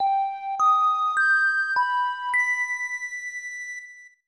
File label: Star Fanfare; Doraemon 2 Nobita no Toys Land Daibouken; SNES